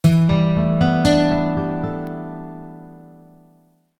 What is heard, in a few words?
lyra kourdismeno; theodyssey